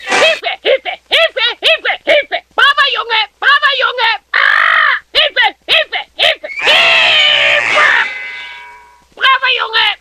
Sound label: gorilla parrot